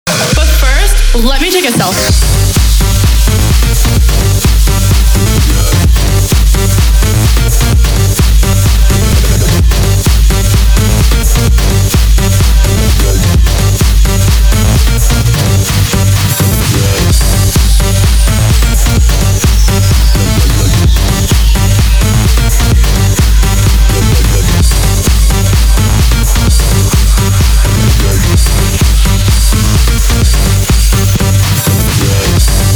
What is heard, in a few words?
REMIX